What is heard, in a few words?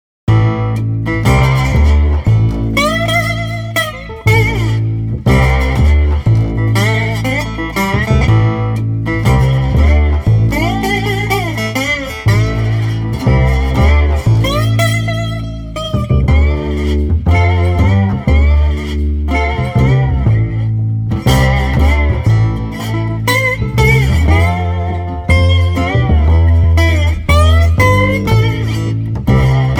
Blues Rock Slide Party no Drums 120